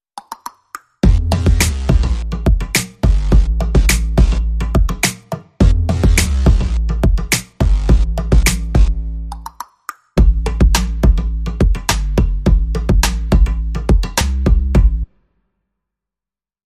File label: Drums Synths and Bass Only